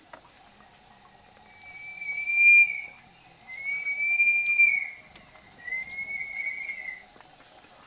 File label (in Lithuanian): Kuoduotoji kurapka